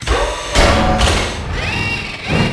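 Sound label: tank attack; quake2; coop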